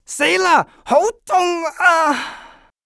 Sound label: HURT; True Crime Streets Of LA; XBOX